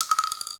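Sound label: case 12 gauge; projectiles; cases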